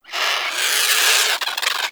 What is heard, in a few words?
Avichaea Vocal Alerted; Hunting Critters